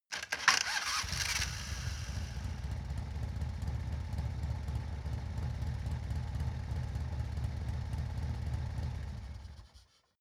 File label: motorcycle harley engine ignition on off